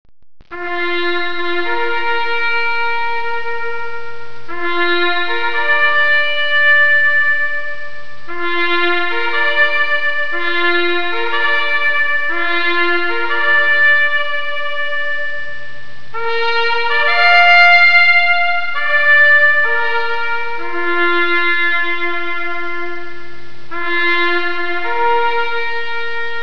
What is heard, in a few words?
taps